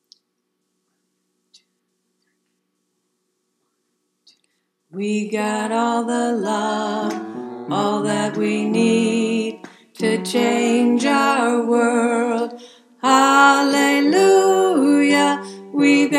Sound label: Tenor, choir